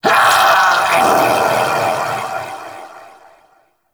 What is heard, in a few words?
Vocal Death Dissolve; Warframe; Duviri; Dax; Ashigaru